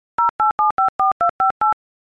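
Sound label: iphone keyboard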